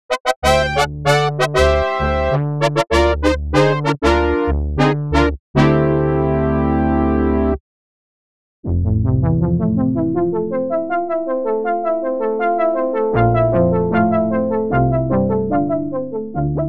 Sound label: brass